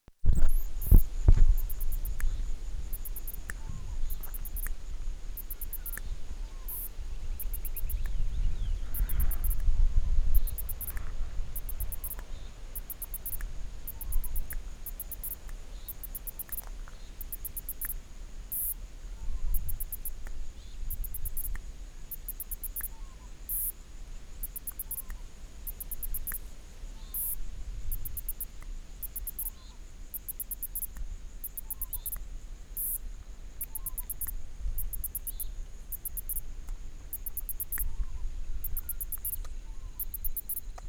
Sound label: yellow grass muta